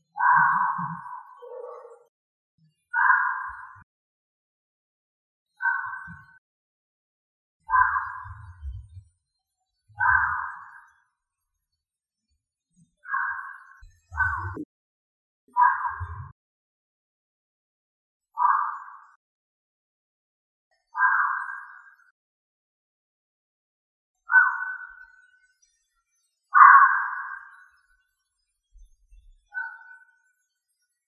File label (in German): komisches geräusch